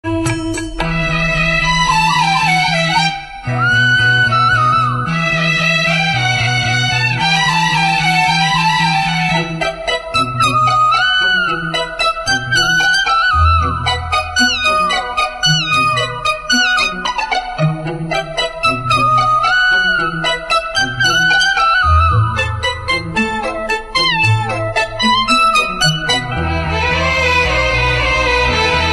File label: bgm